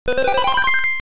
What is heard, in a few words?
Level Start; wario land